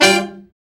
BRA SECT; BRA SECTIONS

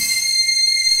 LINEAR SYNTH

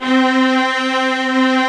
ARCO STRINGS; OMI - Universe of Sounds

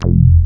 ANA BASS F1; SAMPLE WAVE MIXING 1

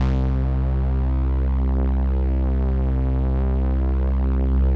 505, String Machines

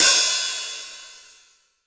HH, ROCK KIT

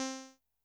korg trident

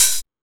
Techno, EMU XL-7

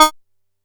D#5, Bass; E-MU Producer Series Vol. 6 – Analogia Project; Boom da Bass

